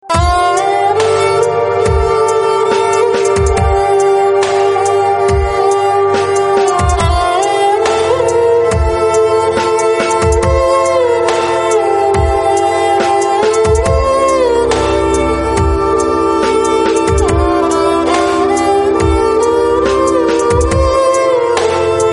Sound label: Sarangi